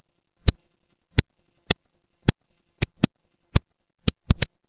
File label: office pen